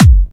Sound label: Bassdrums Clubby Kicks; Vengeance Samples